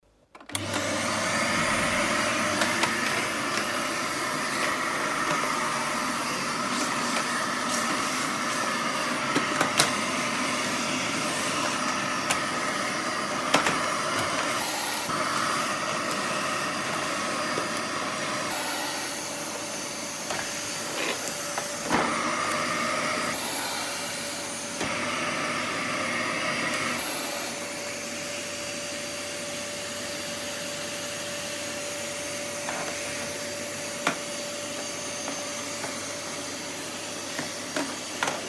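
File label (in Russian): пылесос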